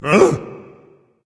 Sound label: confused, berserker; cuchulainn